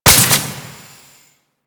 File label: Jetpack Thruster A; Warframe; Corpus Jetpack